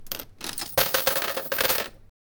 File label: 8 bit cash till opening